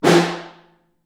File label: Snare Far